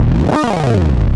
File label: BASS GLIS